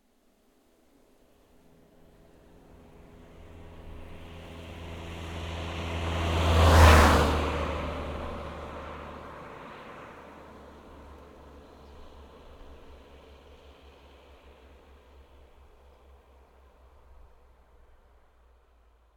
Audi A3 8L 1.9 TDI exterior passby medium speed whoosh; stereo; Soundholder; Cars In Motion II